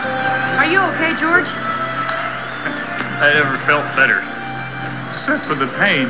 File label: pain